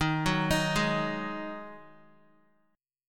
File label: D sharp Minor Double Flat 5th, Guitar; D#mbb5 arpeggiated